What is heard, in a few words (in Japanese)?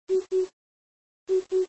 tutudori